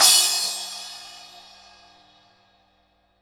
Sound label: SPLASH F, PERCUSSION; Sonic Reality; Interactive Drum Kits